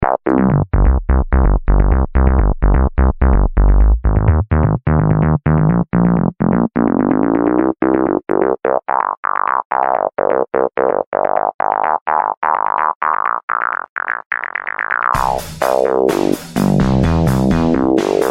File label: instrumental